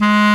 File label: Clarinet & Bass Clarinet